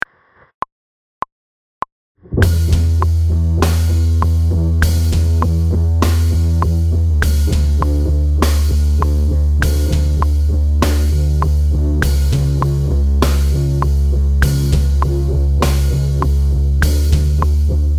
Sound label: no guitar